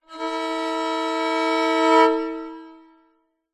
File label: musical violin bowed 2 notes simple